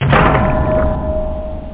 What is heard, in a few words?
falling piano; Amiga; tetris ik